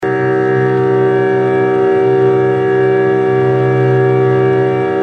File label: microtonal variations of the all-interval-chord; akkord 12 c-1 g-1 e-2 b cis fis